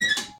Shower on; Phasmophobia

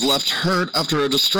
Hurt; Phasmophobia

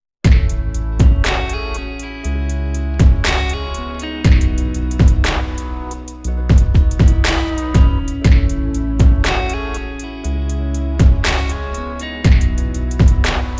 Instrumentals

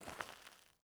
footstep trainers gravel compact walk slow; Phasmophobia

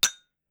glass; Phasmophobia

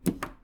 Smudge drop; Phasmophobia

